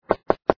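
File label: Sounds knock knock knock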